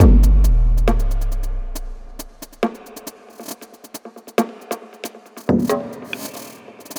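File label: percussion loop, drum n bass; KJ Sawka - Drum n Bass & Beyond; ACIDPlanet Remix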